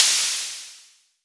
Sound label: HHo Blofeld; Waldorf